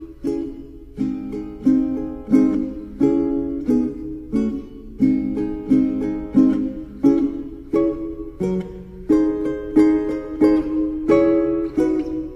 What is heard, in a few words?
castanets, guitar and percussion; Fandango